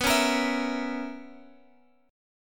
B Major 7th Suspended 2nd Suspended 4th B down Guitar Open G